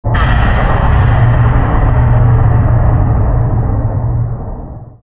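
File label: mysterious percussion, halloween; Garry's Mod; tf2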